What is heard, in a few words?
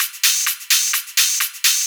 Hihat Loop 128 BPM